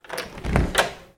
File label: door heavy wood open